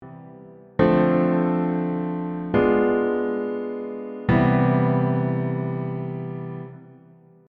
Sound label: dim chord; How to use dim chord